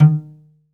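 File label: SOLO CELLO, Synclavier Strings; ILIO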